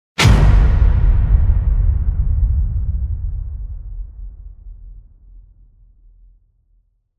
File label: Vent Whack; Gothic Storm Music